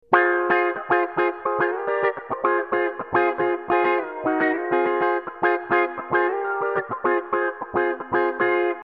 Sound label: funky